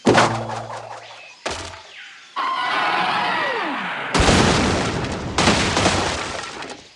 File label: mighty eagle; angry birds